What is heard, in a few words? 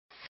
deal cards; hilo